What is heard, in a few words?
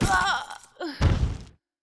Mob die; Monster; 빙갑호위